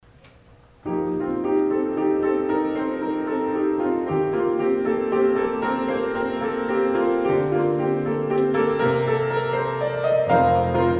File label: Choir; Make A Joyful Noise